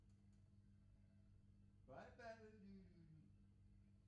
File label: Hammond Leslie